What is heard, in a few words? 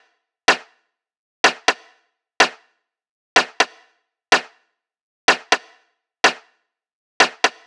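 Snare Loop, 125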